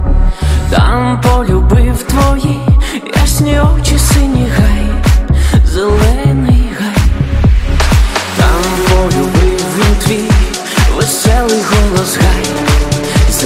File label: dance version pop song tone ringtone